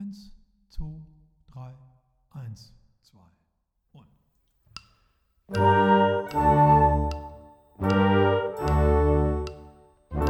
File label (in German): Vivaldi mit click